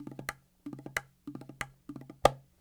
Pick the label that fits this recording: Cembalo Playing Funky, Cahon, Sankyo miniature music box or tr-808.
Cahon